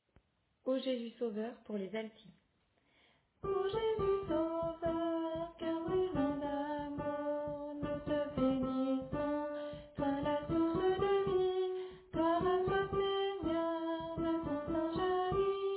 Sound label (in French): Alto